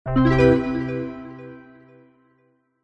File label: multimedia alert bright warm system positive